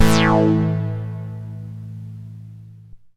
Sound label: MASS LEAD, SYNTH; Keith Emerson Signature Series